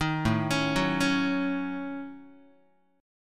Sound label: A 6th Flat 5th A Guitar, chord; A6b5; arpeggiated; JGuitar